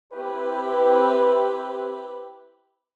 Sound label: Mobile game holy alert notification